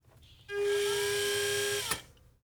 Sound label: electric wheelchair; prosoundfx